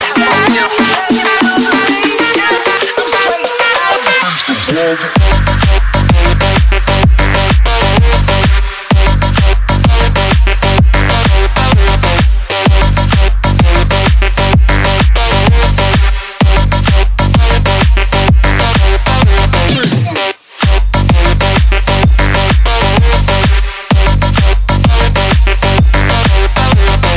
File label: Electro